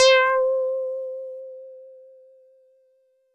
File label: Mono Bass DX100 C6